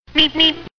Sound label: Road Runner Meep